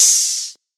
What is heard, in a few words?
subtle reverb open hi hat one shot g key